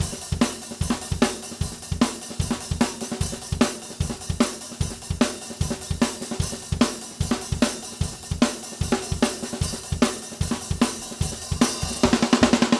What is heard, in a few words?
Beat Loops; Zero-G; Monster Beats